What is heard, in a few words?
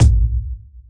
kick